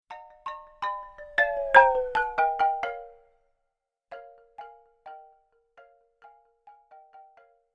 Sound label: Xylophone, doppler shifted ringtones iphone